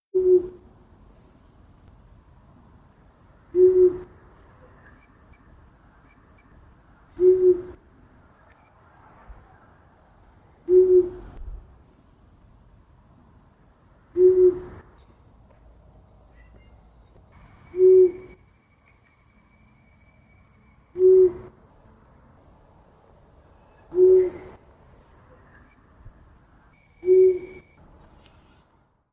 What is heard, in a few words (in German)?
waldohreule rufen